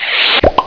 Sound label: Pop; Revelation Sight and Sound SK